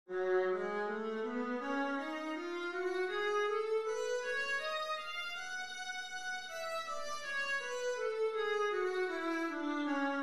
viola f sharp minor, scales

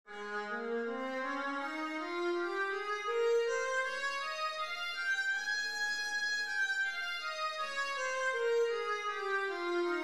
viola a flat major, scales

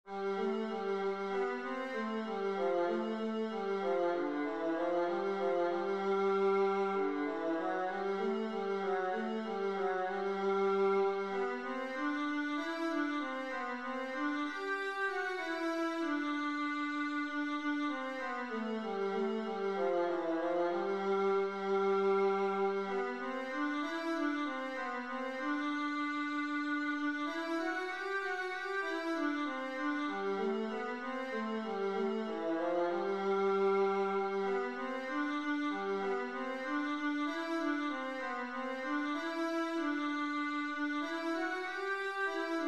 Columba viola

